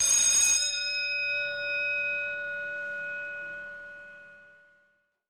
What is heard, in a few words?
Bells